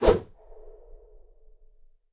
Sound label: wall of spears; odin; smite